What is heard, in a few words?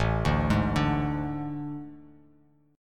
A Major 7th Suspended 4th; AM7sus4; arpeggiated; JGuitar